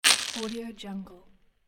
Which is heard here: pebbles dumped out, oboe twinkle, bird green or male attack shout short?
pebbles dumped out